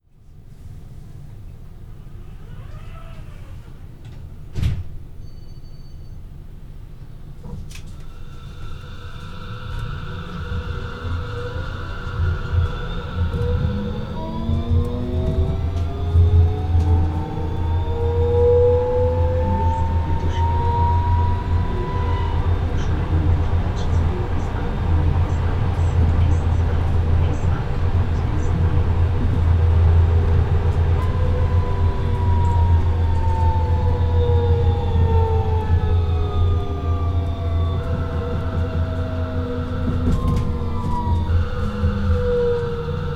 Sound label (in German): Fahrsound S Wagen neuer Fahrmotor; ab Februar 2021